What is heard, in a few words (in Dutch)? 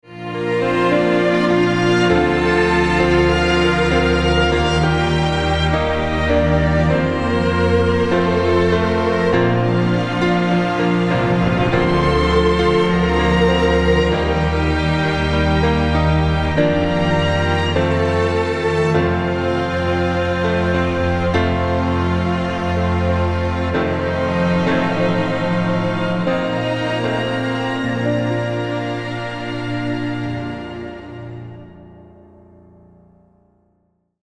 Strijkorkest